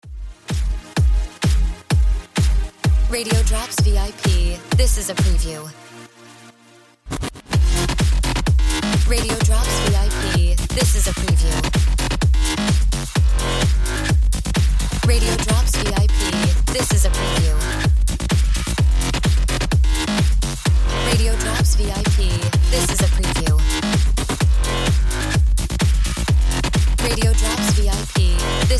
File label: Music Bed